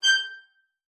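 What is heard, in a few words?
violin spiccato G5; Xsample VOL-5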